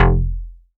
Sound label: Bass, Synths, 5U Modular